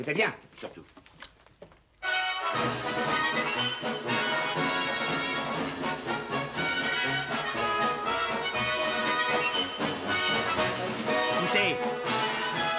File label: music; grand restaurant